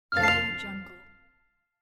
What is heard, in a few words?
the answer is correct sfx; ralphsd